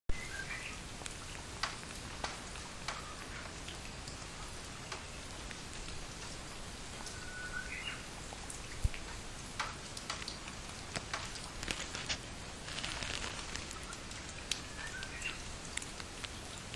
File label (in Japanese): uguisu